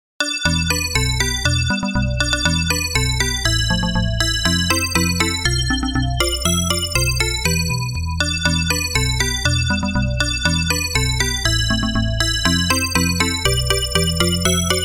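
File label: jingle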